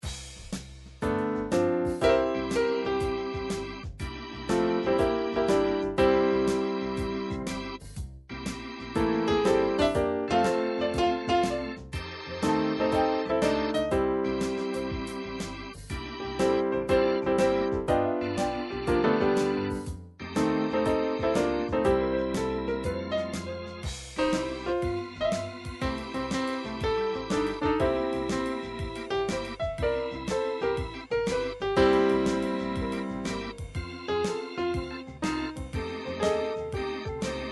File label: long time blues, midi